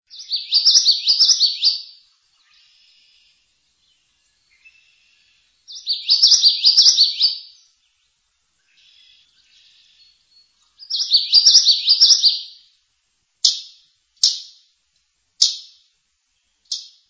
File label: Common Yellowthroat